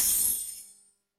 success

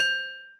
choice right

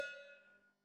card

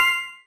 ui confirm

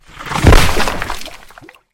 event levee break

